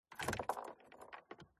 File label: Citroen C2 Jingle Keys Into Ignition; MKH8060; PMSFX